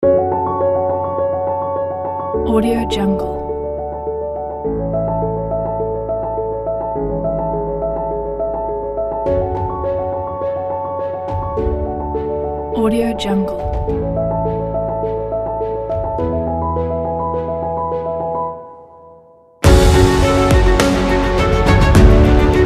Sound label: cinematic dramatic adventure